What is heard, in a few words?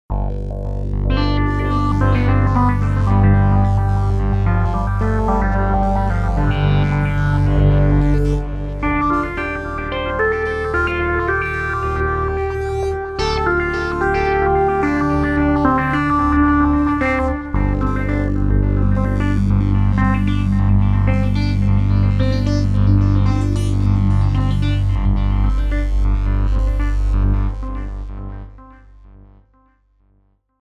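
quiet groove, pad, 110; Oxium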